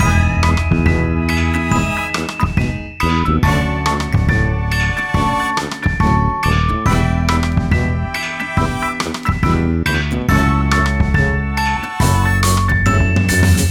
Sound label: Silly Comedy Lose Jingle; Silly Comedy Music Pack